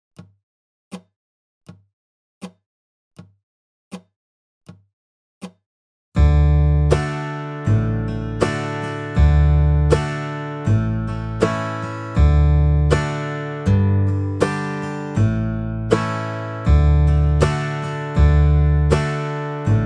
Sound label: backing track 40 BPM; Hark! The Herald Angels Sing; Holiday 2025 Dobro Zoom Workshops